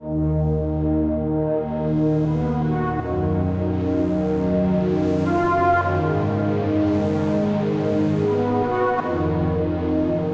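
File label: Searching Pads, D# minor 80 bpm; MIDI Clips; Tonal; Motifs; Ableton; Mood Reel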